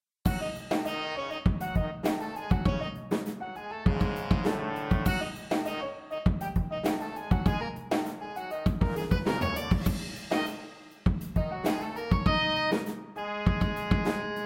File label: Funk Etude